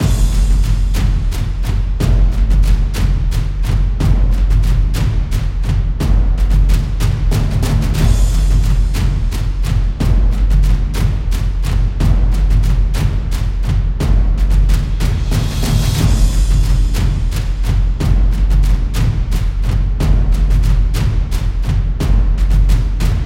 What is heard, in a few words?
Percussion - 120BPM; Construction Kits; STEMS; Ghosthack; SkyRealm; Kit 21 - Mystical Journey